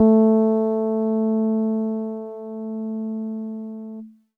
E Acoustic DX100 A2

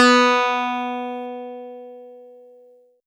Easy Clav DX100 B3

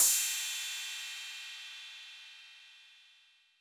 Cym A 808 Sat Decay B, Cymbal, Multi Saturated

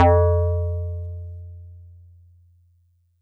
Metal Tube DX100 F3

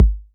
BD 808 Mid Color

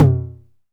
Tom Hi 909 Clean

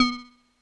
Drops Kawaii B2; keys

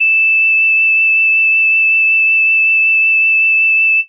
Pan Flute DX100 E6

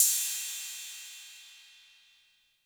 Cym B 808 Tape Decay B; Cymbal; Clean

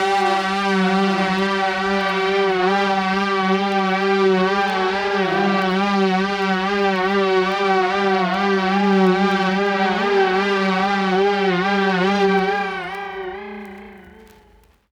Strings Wobble Vinyl Synths G2; TOM

